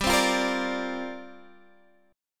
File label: C 9th Suspended 4th G